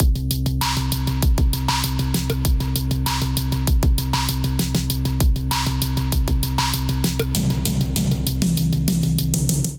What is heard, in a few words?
Give & Take Kit Melodic 98 bpm, Drums, Complex; MIDI Clips; Drive and Glow; Ableton; Factory Packs